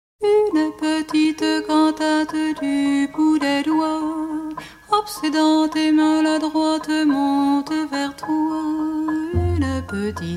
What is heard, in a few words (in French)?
petite cantate